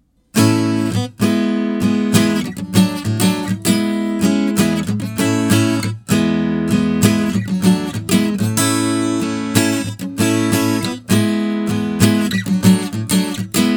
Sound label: dry clip of a cover tune, acoustic guitar; mic placement for acoustic guitar